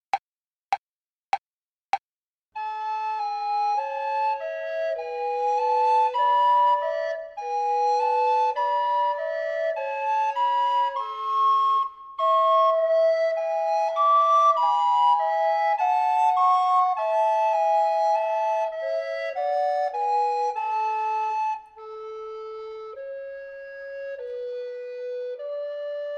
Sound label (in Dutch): Slow Gis